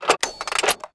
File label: reload